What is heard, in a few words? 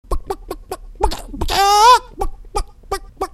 CHICKEN; Huhn; Tiere